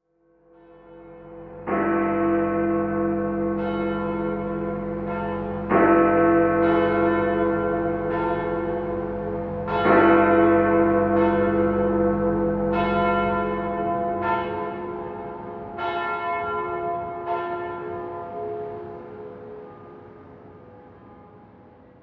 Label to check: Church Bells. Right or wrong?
Right